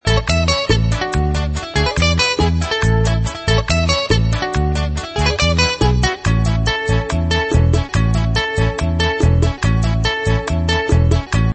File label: music